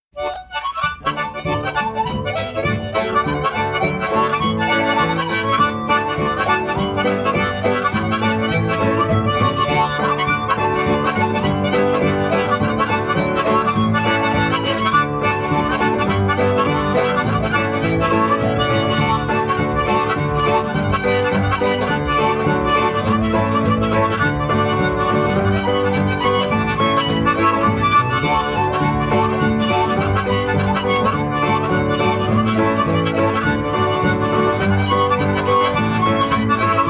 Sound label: Hand Organ